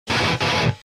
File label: Djent Djent